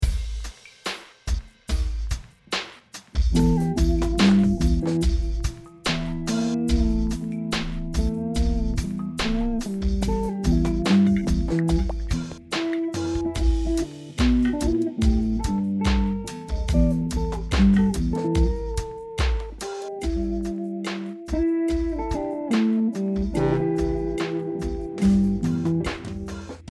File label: slo funk